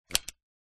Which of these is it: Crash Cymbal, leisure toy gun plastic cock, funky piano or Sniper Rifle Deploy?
leisure toy gun plastic cock